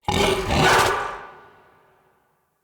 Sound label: Battle Dog Enemy Spotted, Animals; Vocals; Snc Battle Dog